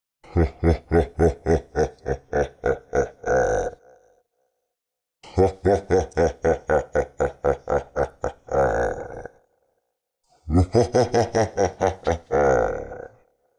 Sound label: Scary Horror Laugh